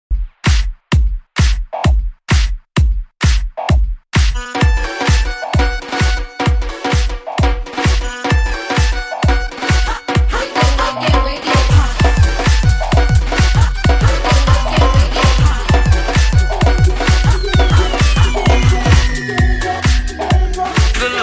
Beats

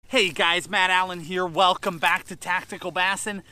Tactical Bassin

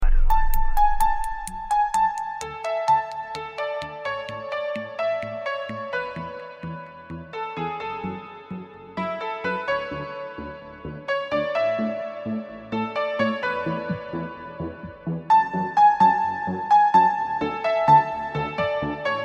Love Tune

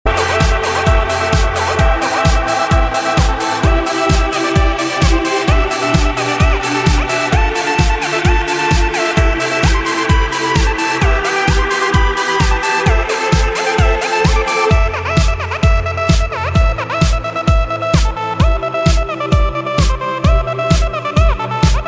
Electro

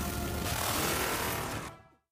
Buzz Sound

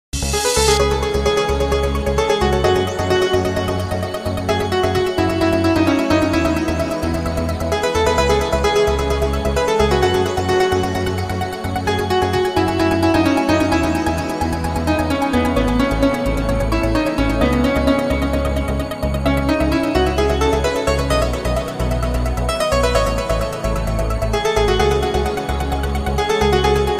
Guitar Fast Tone

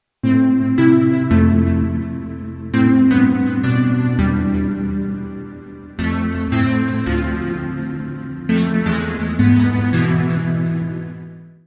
Ring

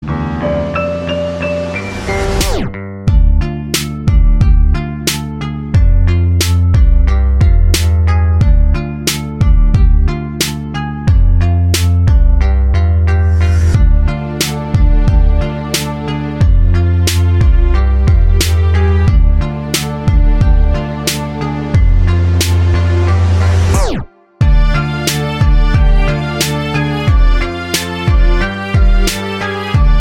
Freestyle rap beat

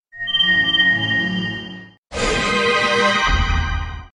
healing

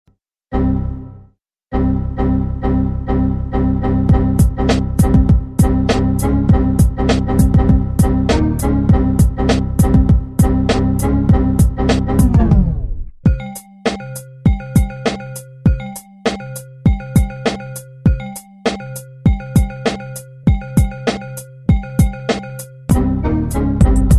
Windows Cool

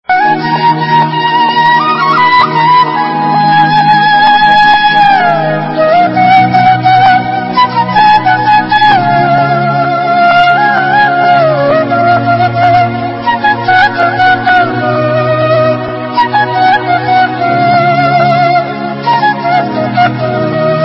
love music

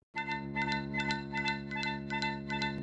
Alert; Jonny Quest

